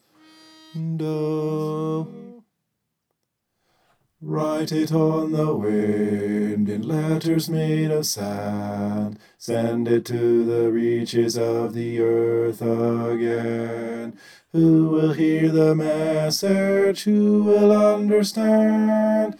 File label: bass